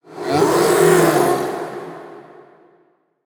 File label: Arcane Servant Death